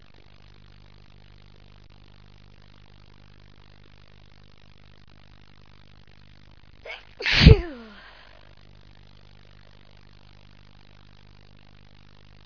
trio, female

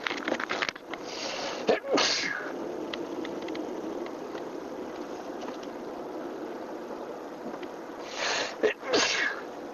car sneezes, male

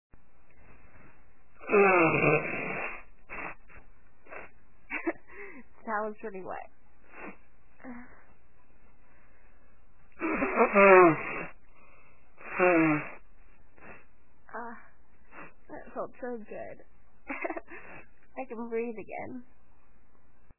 blow breath again, female

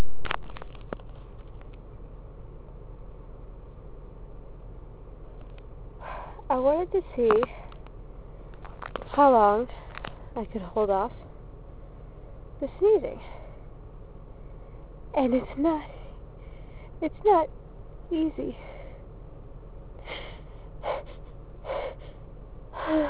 holdback, female

